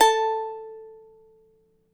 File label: CHARANGO